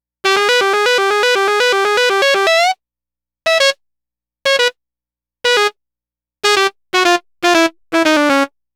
Sax Line; Synthetic Winds; Dr. Fink's Funk Factory

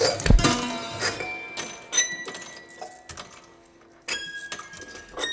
Prepared Piano; Battery 3 Sample Kits

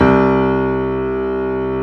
Piano C2; Best Service Gigapack 1

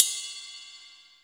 EDGE CUP; Back In Time Records; Big Bang; THE ROOM

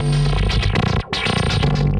Processed Perc

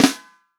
REAL DRUMS FUNK KIT

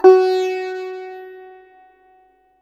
SITAR GB5; Spectrasonics; Heart of Asia

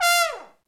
THE TROMBONE

